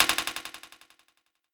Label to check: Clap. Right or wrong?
Wrong